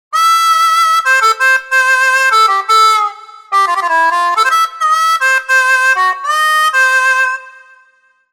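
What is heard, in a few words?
mouth harp; TURBO VL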